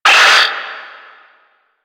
Screen Flicker; Warframe; Duviri; Easter Eggs

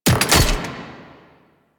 Archgun Charged Mech; Warframe; Archwing

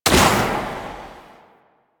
Shot, Pistol; Warframe; Weapons; Tenno; Priest; Knell Prime

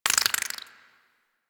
Infested Burst Rifle Mech; Warframe

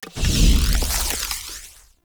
Thorn Bow Equip; Weapons; Player; Bows; Fey Thorn Bow

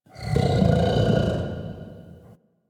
interior light burps; Ambience; Misc Indoor; DE; Warframe; Infested Eris

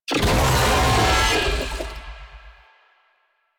Infested Oni Vocal Pain; Warframe

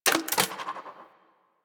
Spear Mech; Weapons; Warframe; Tenno; Priest Spear

